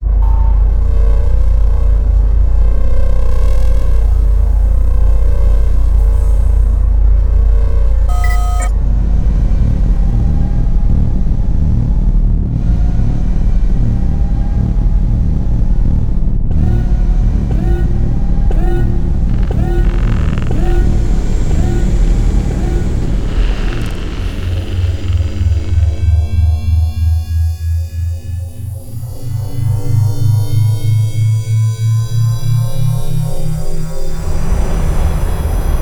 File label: Spaceships Science Fiction Sound Effects; Bluezone